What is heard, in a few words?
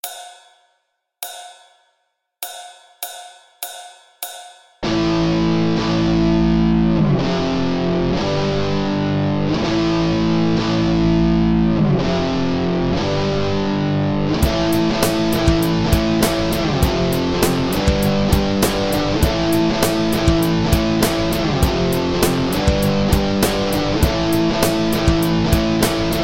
Am F G accompaniment, guitar; easy to play guitar solo made of a few licks and tricks